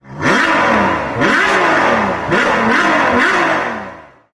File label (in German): sportwagen sounds ferrari; 812 superfast